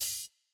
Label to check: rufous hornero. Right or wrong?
Wrong